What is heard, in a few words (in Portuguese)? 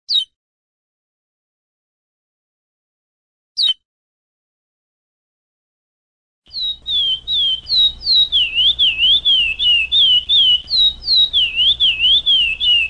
canto pandeirinho; florianpolis